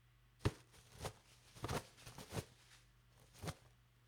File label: Pull Tissues